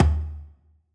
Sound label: percussion oneshot; Pop; Drums; SD PASADENA Pop Sample Pack; Slate